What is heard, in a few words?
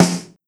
Snare Rock Acoustic